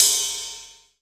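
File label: RIDE LOOP, DRUM KITS; InVision Interactive; 40 Oz Phat Slammin Hip Hop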